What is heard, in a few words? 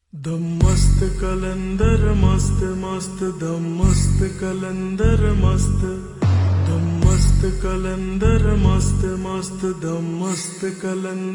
Slowed Reverb Ringtone